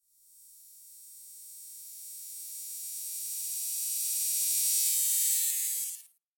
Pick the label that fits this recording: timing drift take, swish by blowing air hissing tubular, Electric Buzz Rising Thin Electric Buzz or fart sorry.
Electric Buzz Rising Thin Electric Buzz